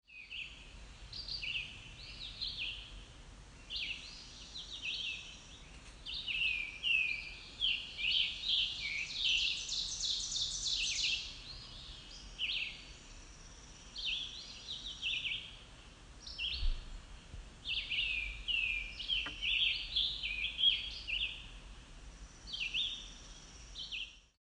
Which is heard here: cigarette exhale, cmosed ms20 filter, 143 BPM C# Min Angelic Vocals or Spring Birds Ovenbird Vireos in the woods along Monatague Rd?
Spring Birds Ovenbird Vireos in the woods along Monatague Rd